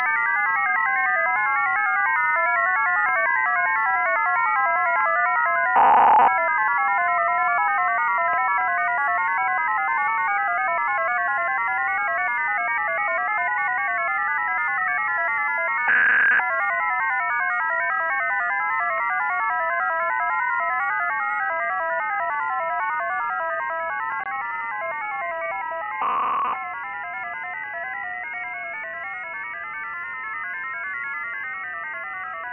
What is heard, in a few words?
mfsk-16 5-channel 20bd shift 1600hz, psk-2; rus intel